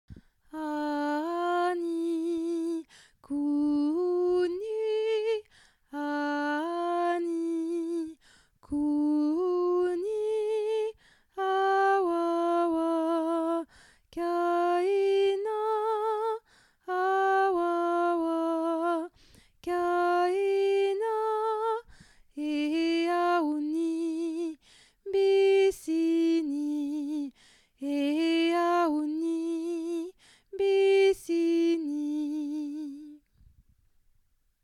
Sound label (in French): alto; ani couni